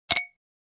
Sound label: Ice Cubes in Drinking Glass